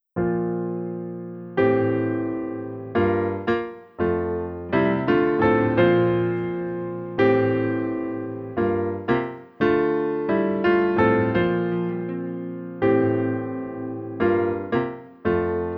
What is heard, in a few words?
seasons of thespians song challenge instrumental track